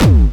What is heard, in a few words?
BD, Dance Mega Drums, BD HOU; Best Service